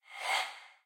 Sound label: Ghost; peace death 2017 pc